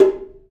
Java Drums; E-MU Producer Series Vol. 5 – 3-D Audio Collection; 3DSprints; 3DJavaDrums

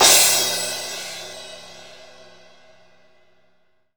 crash; East Collexion; Drum 1 Dry

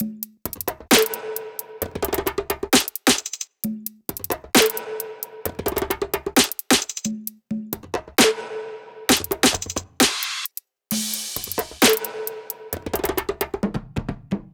loop top 132, Seismic Loops

